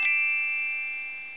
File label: drop; McDonaldland - Silly Games; McDonald's; treasure